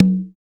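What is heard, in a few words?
conga open low; CONGAS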